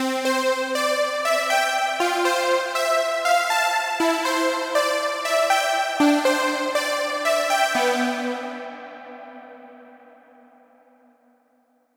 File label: Melody Lead Pop; midi wizard